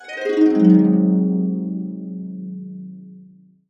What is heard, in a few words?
Harps; USB Soundscan vol.62 - Complete Harps; AKAI